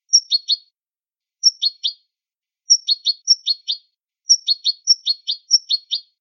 adornos

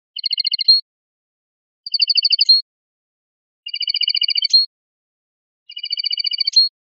repertorio currupin, campero

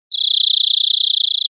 encarrizado fino; verderon

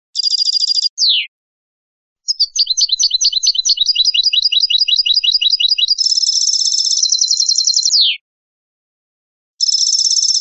faltas terminacion suelta doble entrada enlace

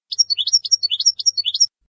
floreo titibali titibali; campero

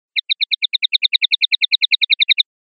rep sonajas

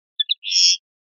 rep ranadas, pardillo

